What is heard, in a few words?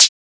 Closed Hat; FLPRO